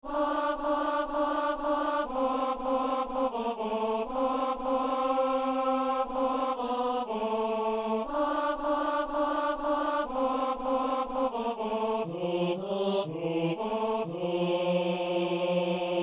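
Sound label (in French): messe alleluia ténor; messe de saint jean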